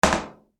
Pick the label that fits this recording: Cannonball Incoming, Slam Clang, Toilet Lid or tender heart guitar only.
Toilet Lid